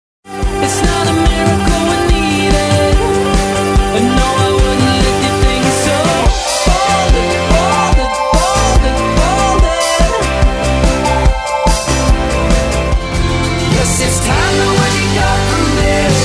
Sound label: adult rock